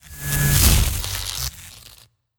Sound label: Attack Charge; Sorcerer